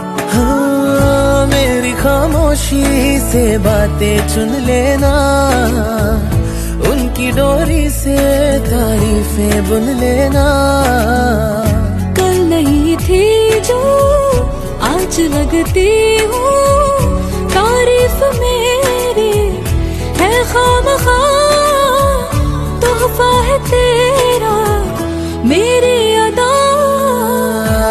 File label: Most Beautiful Love Song Ringtone; ringtones